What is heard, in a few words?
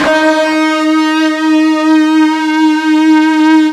FUZZ D#3, Guitar; East West; Steve Stevens Guitar Samples Collection